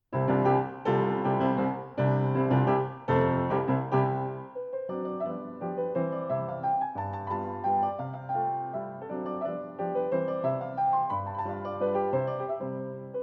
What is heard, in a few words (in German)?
Mazurka C-Dur; Op 68 Nr 1